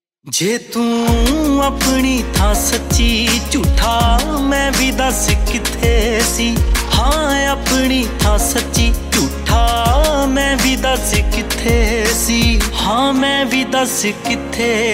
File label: punjabi ringtone